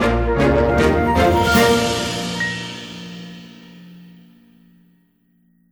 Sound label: music jingle NPC unlock